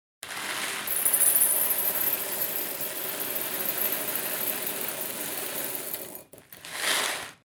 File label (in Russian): zvuk peresypanija